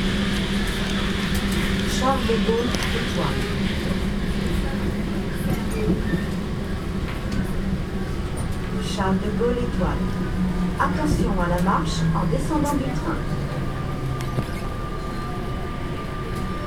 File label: subways charles de gaulle